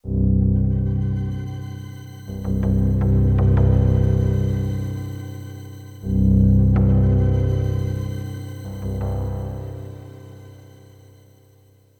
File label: SYNTH PAD AMBNT 160 C, Ambient; Sphere